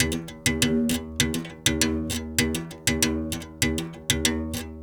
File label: Tar, World Percussion